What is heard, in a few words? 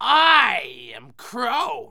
TCrow, Crow Sounds